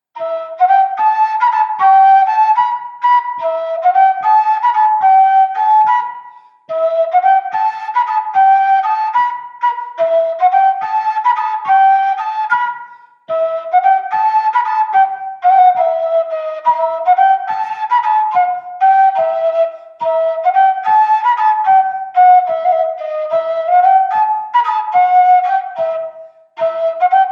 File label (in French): Bourree saintongeoise